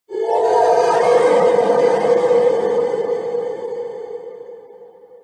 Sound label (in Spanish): Grito; Hatterene Gigamax